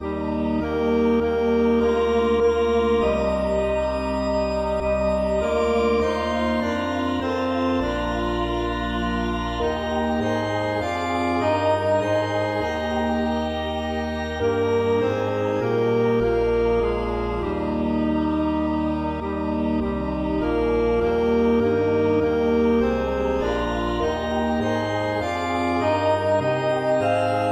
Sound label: hymns